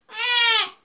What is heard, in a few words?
Lamb Voice